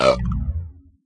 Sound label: drink burp; There; ThereClient